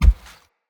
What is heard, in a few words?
Step Default, Animals, Foley, Steps; Bromius